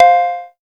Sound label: SHANGHAI C5; Q Up Arts; Dream Experience